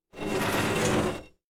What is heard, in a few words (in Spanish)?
MOVER ALCANTARILLA; yesterday origins gamerip 2016 switch ps4 pc ios macos